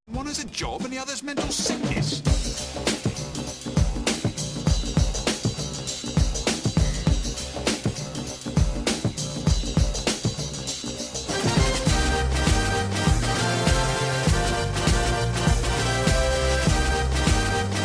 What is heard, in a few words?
Sniper Remix loop; adpcm; thejuke